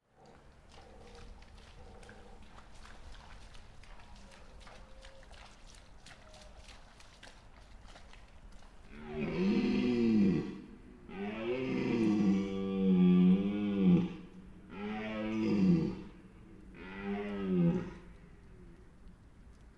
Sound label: The Deer, Abruzzo; Deer, Parco nazionale d’Abruzzo, Lazio e Molise, Italy in September 2024; Every year in September and October is the deer mating season, you can hear them all over the natural park; Cosmin Sandu